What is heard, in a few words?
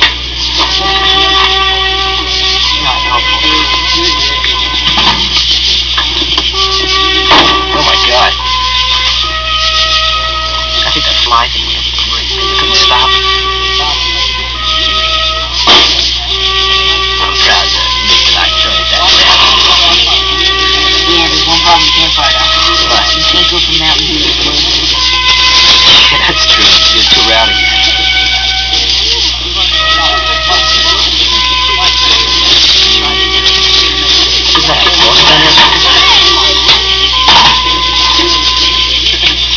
Taps; Bidlack; Resica